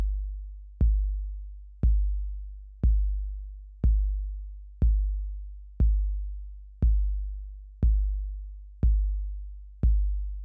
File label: superdirt supercollider test kick audio